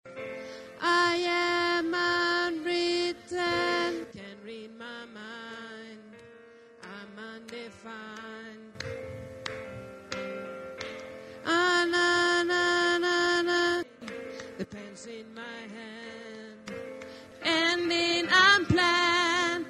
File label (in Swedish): bas, stämmor